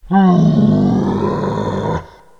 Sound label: moan, Monsters; Creatures